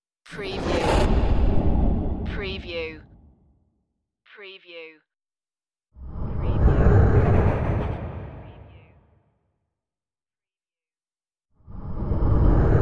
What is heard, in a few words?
dark suction whooshes